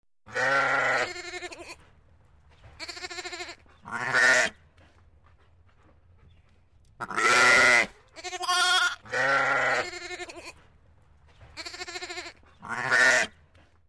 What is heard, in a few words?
Bleating goat and offspring